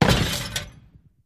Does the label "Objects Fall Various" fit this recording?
Yes